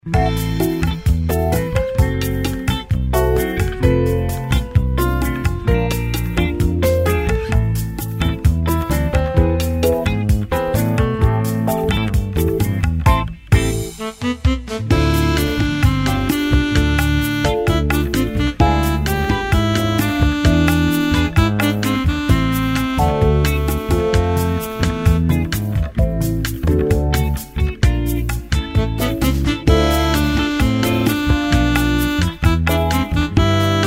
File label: Birds sax